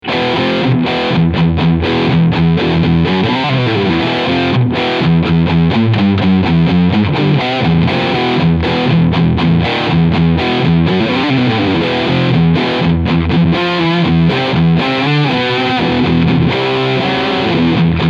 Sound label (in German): FGN Expert Flame E Gitarre Overdrive Riff Steg PU